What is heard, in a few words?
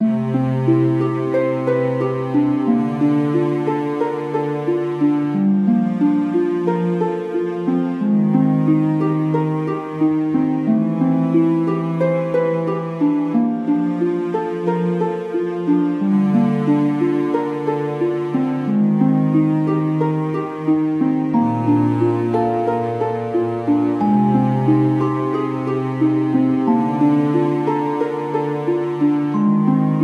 bgm game sea